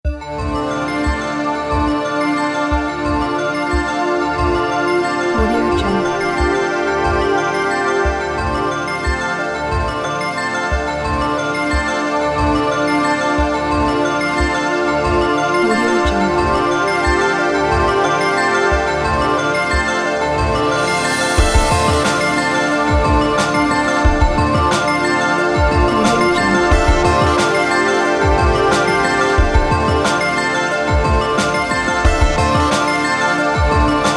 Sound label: trip hop ambiences